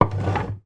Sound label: household bread bin short slide along worktop